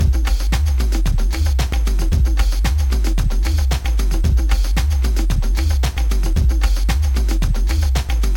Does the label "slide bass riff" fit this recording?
Yes